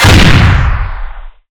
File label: rocket explosion